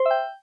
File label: open